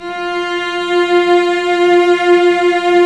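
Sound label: cellos, vibrato, mezzo forte; String Master Series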